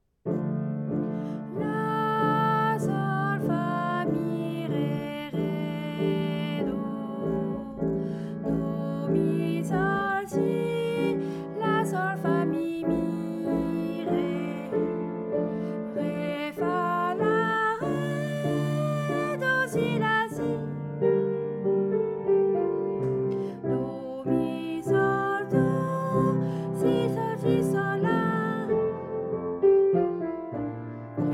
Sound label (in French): voix; Albinoni